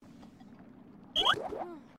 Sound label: Dodge